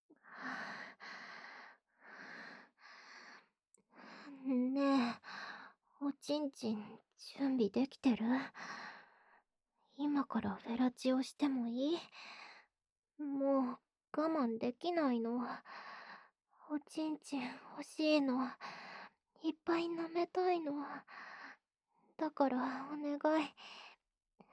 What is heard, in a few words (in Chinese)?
浓厚口交, ASMR; 辅助自慰用